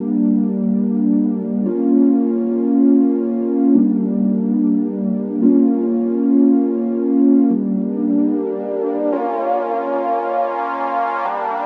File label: Pad Chords, EDM; Sonic Foundry (Sony Creative Software); Afterhours EDM; Retro Fit